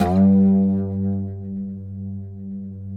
PLK OUD; Roland - LA Composer vol. 3

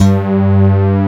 WIRE BASS; MX+BASS

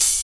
HH, Dance Mix

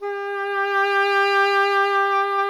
E. Horn

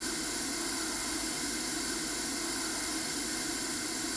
GAS ST, GAS STREA; Total Control - Action Cutz

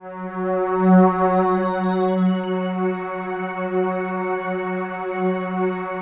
COSMIC, PADS; Masterbits; Vintage Voltage

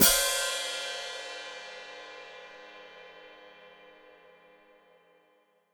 Hat Closed Open, Hi-Hat

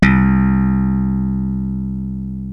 SLAP BASS; Kid Nepro; Elite

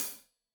Hat Loose, Hi-Hat, Cymbals Stereo

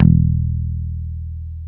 BASS, E 2

